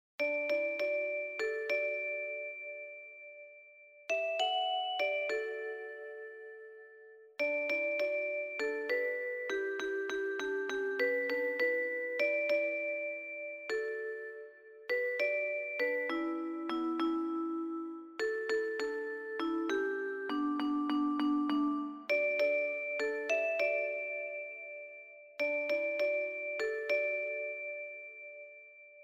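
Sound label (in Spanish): Canto de Candomble 1 voice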